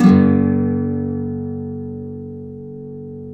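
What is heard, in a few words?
nylon up major G#, guitars, flam chords; Spectrasonics; Hans Zimmer Guitars VOL-2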